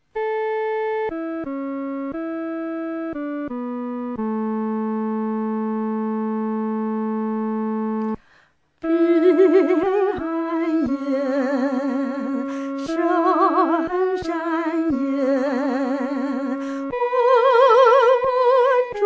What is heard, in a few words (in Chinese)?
独唱（第一声部）; 平安夜